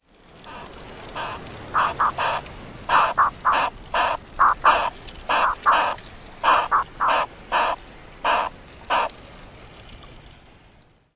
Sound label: guttural calls, WISTPE